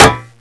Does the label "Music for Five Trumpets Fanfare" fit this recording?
No